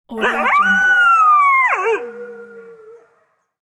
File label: wolf whines